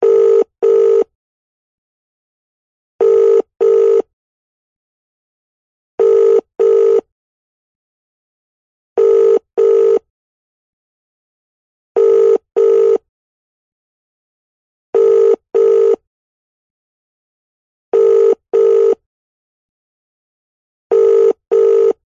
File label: household telephone ring speaker uk med